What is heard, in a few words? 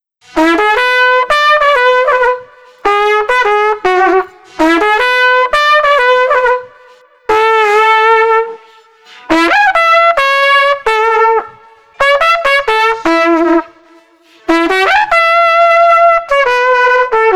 Trumpet Solo 110